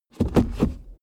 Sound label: suv change reverse gear fast